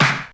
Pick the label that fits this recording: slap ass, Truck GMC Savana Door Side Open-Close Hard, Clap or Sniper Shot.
Clap